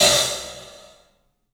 club hat; 3D Sound; 3D Drums

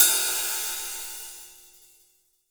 ZIL, 20 ZIL RIDE

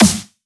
Snares; Vengeance - Essential Clubsounds Vol.4

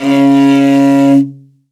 L, morin khuur, World Instruments

